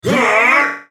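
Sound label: spellcast effort; Jayce Sentinel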